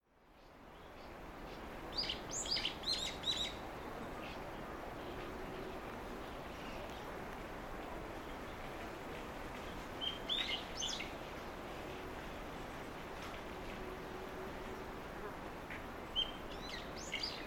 hiyodori

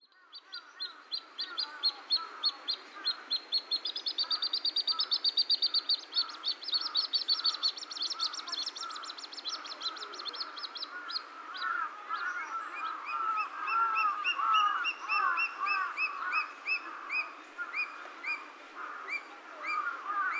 カイツブリ 幼鳥; 練馬区石神井

